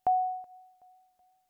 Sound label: beep